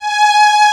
VP ST G4, ANA STR

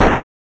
FX, ANALOG; Sound & Vision - Gigapack II

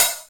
HH, REAL

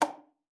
D#4, alto sax; Xsample VOL-2

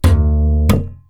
guitar that probably can be; random and out of place

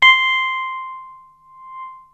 PNO THICK; MORPHEUS

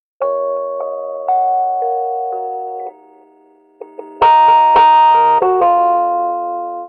Rhodes Solo, Cinematic Funk; Foundry (Sony Creative Software); Cinematic Funk Urban Tone Poems; Solos

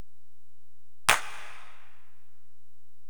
Korg x5, Drums

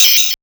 fx-percussion, Techno; Techno ID; single-drums

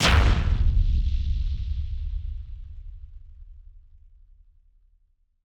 Hit Splosion; musicradar; bomb factory

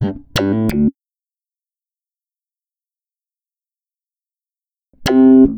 Electric Bass

